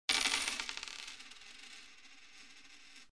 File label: coins dropped; The Witcher Hunt